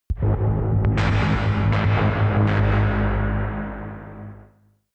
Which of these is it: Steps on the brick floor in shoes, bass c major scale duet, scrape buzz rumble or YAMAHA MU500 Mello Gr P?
scrape buzz rumble